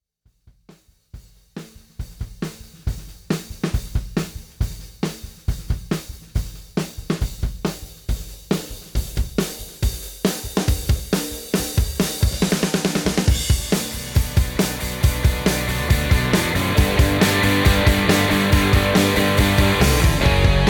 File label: 60s rock, drum